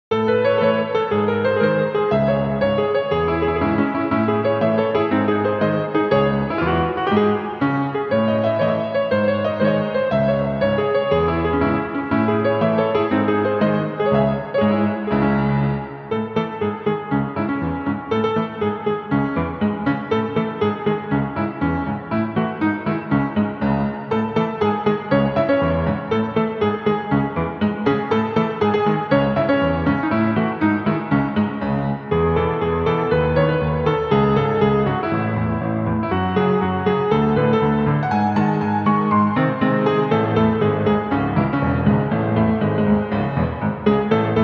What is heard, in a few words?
Cover, piano version